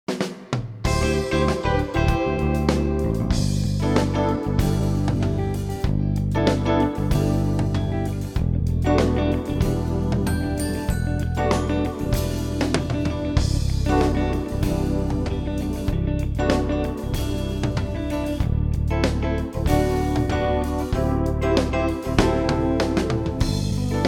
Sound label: Smooth